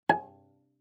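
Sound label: Cello pizz fortissimo sul A Ab4; MIS Pitches - 2014; Strings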